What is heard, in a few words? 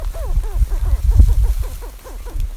Blue petrel